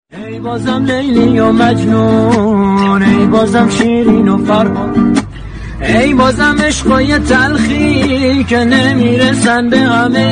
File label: Guitar Version